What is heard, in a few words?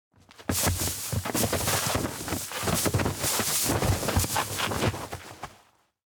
steps outdoor grassland run roll triple tumble; anemoi